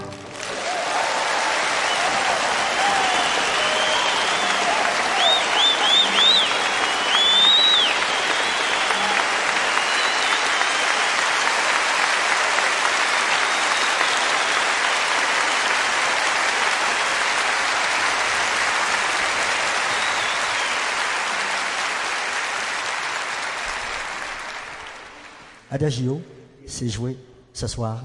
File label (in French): Applaudissements Et Présentation